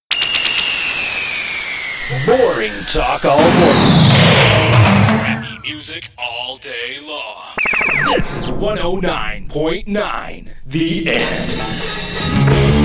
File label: bumper music